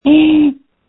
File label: screams